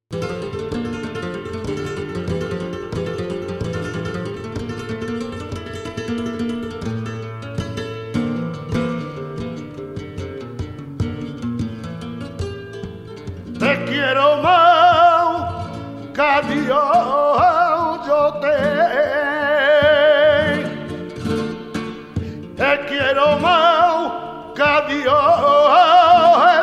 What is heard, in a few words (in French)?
soleá de La Andonda, chant, guitare; "Te quiero más que a Dios..."